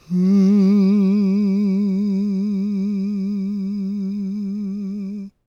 GOSP MALE, SOLO; Spectrasonics; Vocal Planet; Gospel

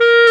WND HARD, Clarinets; Clar Bryt

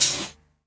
HH, Dance Mega Drums; Best Service; HH HIP

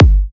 Hard Kicks G; Antidote Advent

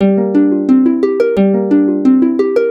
Happy Timez Harp-G, lounge, 088; Zero-G Kocktail Kollection; Construction Kitz (Lounge); Happy Timez Mixes 088

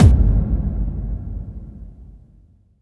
BD-FX, Dance Mega Drums; Best Service

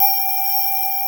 male vox G5

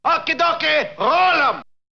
Vocal; ZERO G - Datafile DISC 1

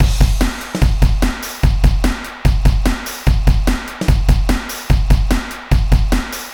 Groovy Drums, drumloops; Dr. Fink's Funk Factory

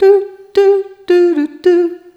Rhythmic G

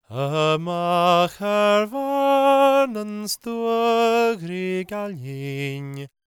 GAELIC, World Voices; ILIO; Vocal Planet

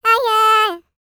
LICKS, Vocal Planet Jazz FX; Spectrasonics

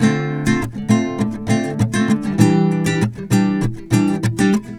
GUITAR SAMBA

